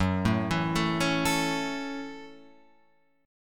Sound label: F sharp Minor 7th F sharp, Guitar Open F; arpeggiated; JGuitar